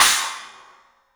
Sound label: CYMBALS; 3D Sound; 3D Drums